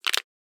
Can Twist, Objects and Items; Survival Game Sounds - Lite Edition

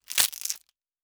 Wood; Survival Game Sounds - Lite Edition; Environment

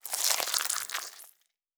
Blood & Gore; Survival Game Sounds - Lite Edition; Combat and Hunting

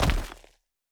Rock Impact; Survival Game Sounds - Lite Edition; Environment

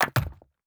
Build Interface

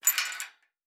Metal Door Handle; Survival Game Sounds - Lite Edition; Doors

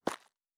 Dirt Walk Surface, Footsteps; Survival Game Sounds - Lite Edition

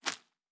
Leaf Walk Surface, Footsteps

